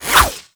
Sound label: Baton Whoosh; Warframe; Lasrian Soldiers; Weapons